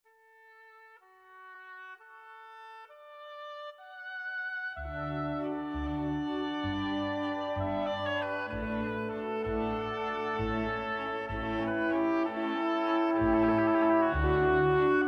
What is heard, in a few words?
meditations